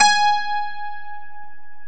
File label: 12 STRING